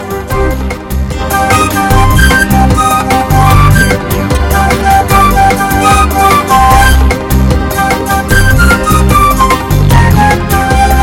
Hot Unplugged Gipsy

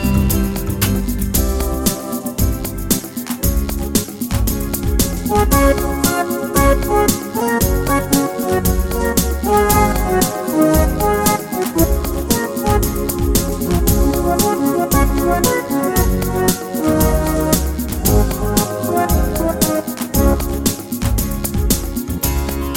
A Great Ballad

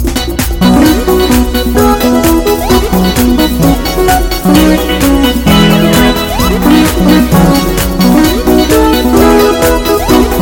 Great Party Music

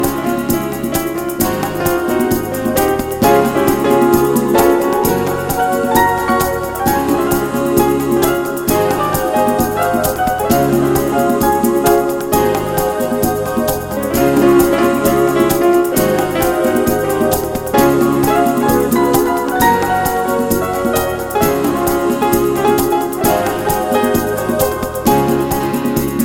Flying High and Fast

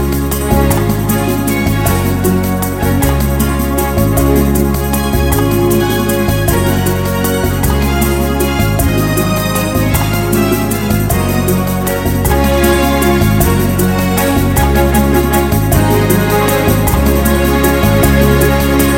Smooth and Beautiful Ballad

